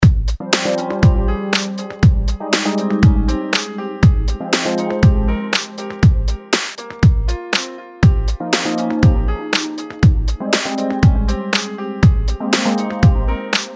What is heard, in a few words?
hiphop